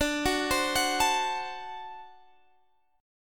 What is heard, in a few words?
D 7th, down, Guitar, Standard; D7 arpeggiated; JGuitar